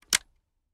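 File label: selector off, weapons foley; gmod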